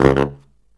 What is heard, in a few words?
Killer Brass Riffs; USB Soundscan vol.29 - Killer Brass Riffs; AKAI; BARYT